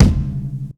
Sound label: Kick, Drum Hits